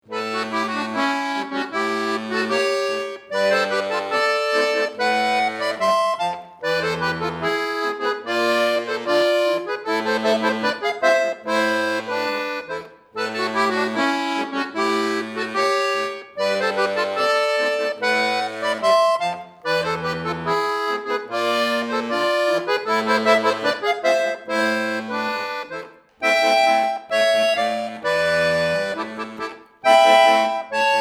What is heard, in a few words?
musette miniature cha cha cha